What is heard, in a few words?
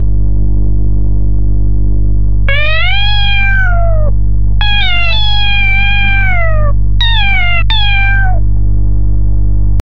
rasta music but with cat s, reggae